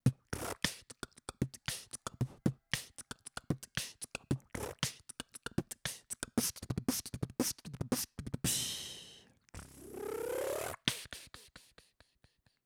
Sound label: bubble scratch eight beat